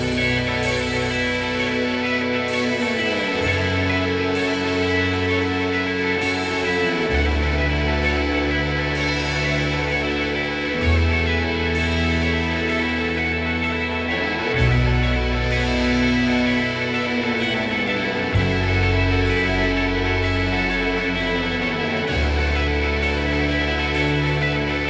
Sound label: happy song